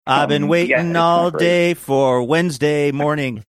singing i've been waiting all day for wednesday morning